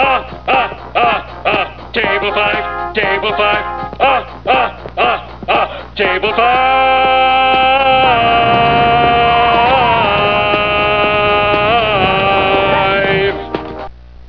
singing; homer

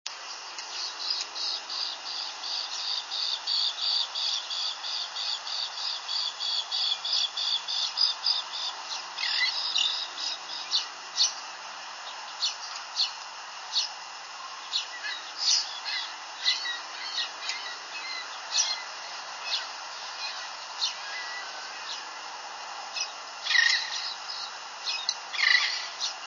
tern common

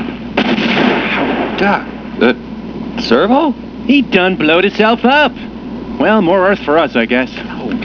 blowup; He done blowed hisself up! Well more Earth for us I guess.; Diabolik

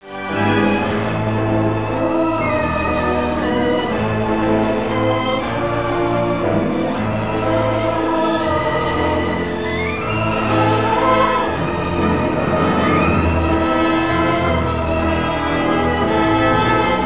orch; halloween

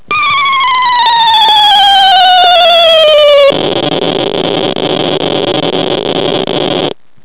bomb

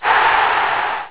cheer

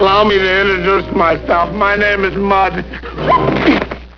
mud

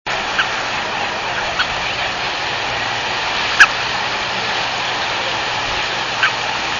Ruddy Turnstone

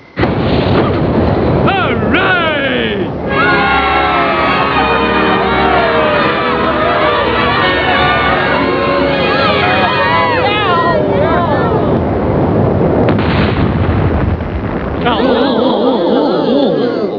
boom

